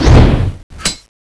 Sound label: stab, weapons; janus9